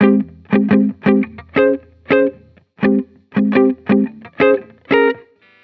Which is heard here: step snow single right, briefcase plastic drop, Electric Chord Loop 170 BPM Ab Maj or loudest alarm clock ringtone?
Electric Chord Loop 170 BPM Ab Maj